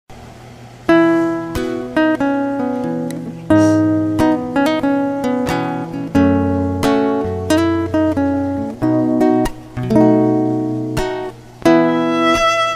Violin and Guitar Cover

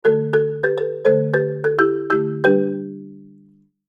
marimba ringtone

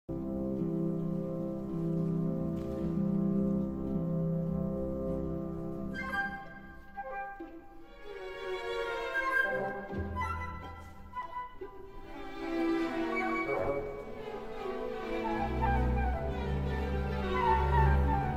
waltz of the snowflakes; casse noisette

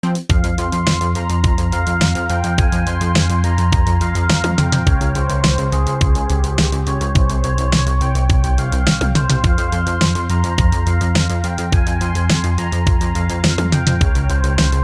synthwave city animation loop

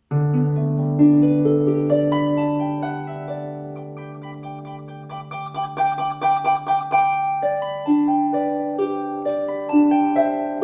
jingle bells celtic harp christmas music

